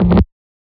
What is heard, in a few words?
fx coil